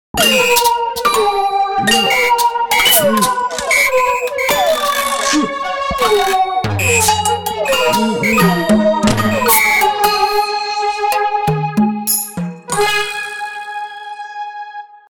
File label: chime